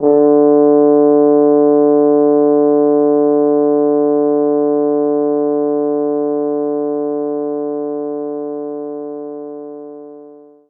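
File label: French Horn mezzo forte D2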